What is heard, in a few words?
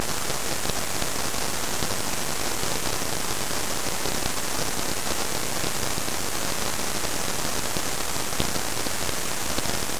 crackle